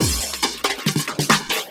Loops Fillin140; Fills; Vengeance Samples

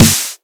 Claps & Snares

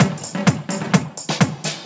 Percussive Loops 128BPM; Vengeance Samples

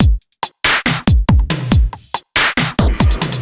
Slomo Beat 140 BPM

